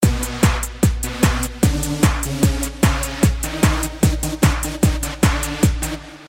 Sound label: Basic Dance Sound